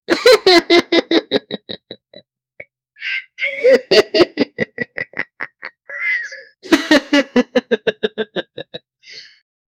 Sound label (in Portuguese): risada malefica de uma, halloween